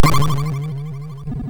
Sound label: Die Alien Slime, MOS6581R2